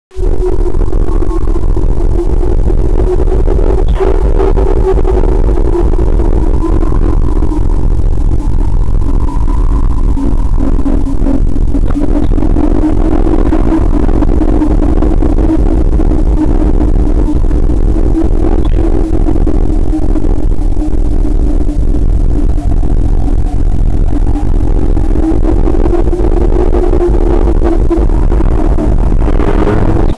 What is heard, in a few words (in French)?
vent